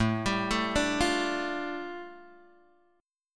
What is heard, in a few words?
A 7th Suspended 4th Sharp 5th A Guitar Open G, chord